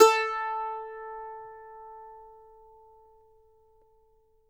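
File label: Mandolin, Country; Sampleheads; Whole Lotta Country 1 & 2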